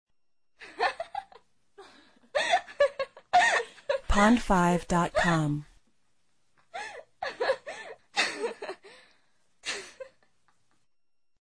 good laughter

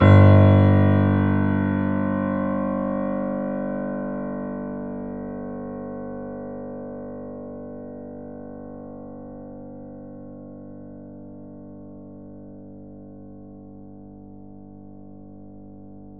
Grand Piano G0 f

